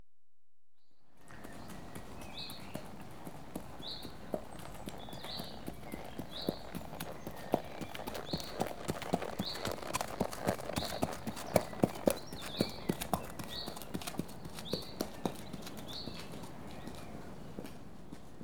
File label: One Unshod Horse Passes At Walk; Horses & Dogs; BBC Sound Effects Library